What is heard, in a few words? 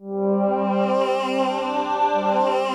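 FILM MUSIC G3, PROPHET VS; Greytsounds VOL-1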